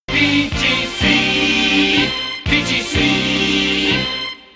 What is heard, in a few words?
jingles; tm radio express comparision